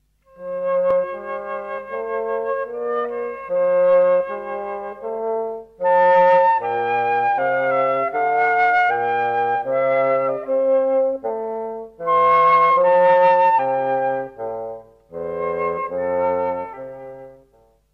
Houtblazers dwarsfluit en fagot

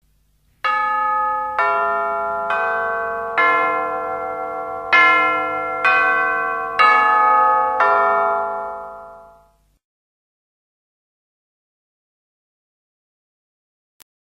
Buisklokken

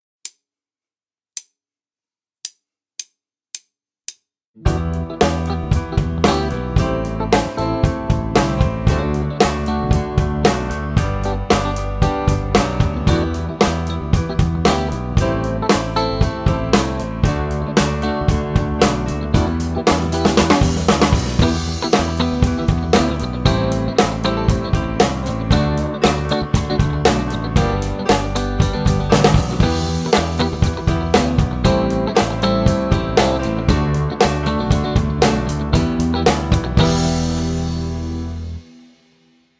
Improviseren met b a g en e

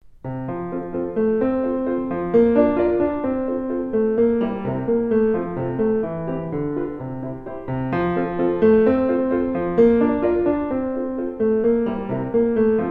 Pianovoorbeelden; Schumann; Welk liedje herken je

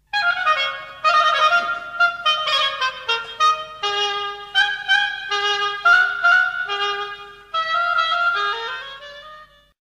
Houtblazers hobo alleen

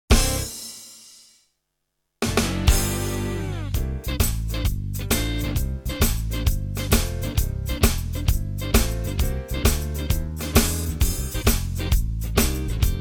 Sinterklaasblues instrumentaal

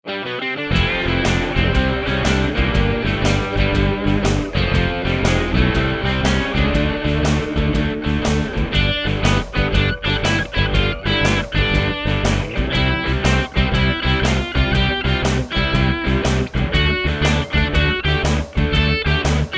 Brievenbusblues

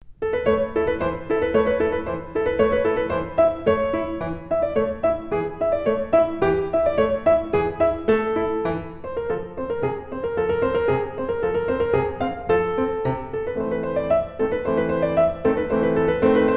Pianovoorbeeld; Kabalevsky

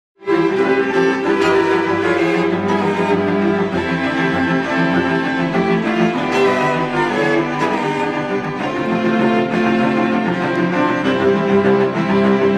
Trein afremmen; Heitor Villa-Lobos

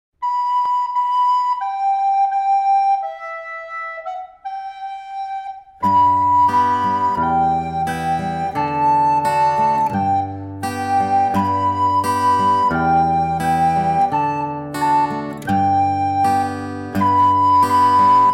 Begeleiding blokfluit zigge zagge mannetje

